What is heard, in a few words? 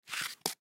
Flip Flops Pick Up; Cement; Shoes; Objects